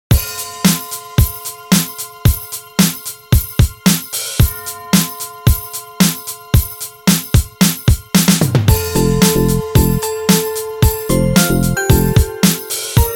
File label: JINGLE DISCO